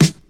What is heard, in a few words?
boom snare drum sound d sharp key; snare drum samples